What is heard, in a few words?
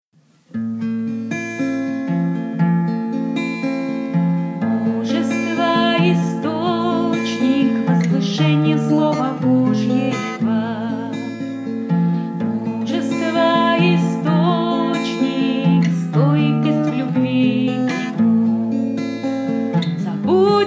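Song, Russian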